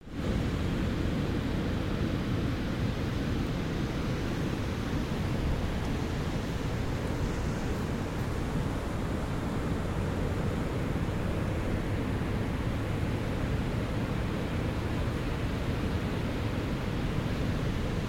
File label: ocean beach